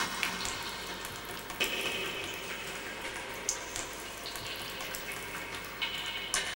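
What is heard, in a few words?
Sewer Water Drip loop; silent hill homecoming 2008 gamerip pc ps3 x360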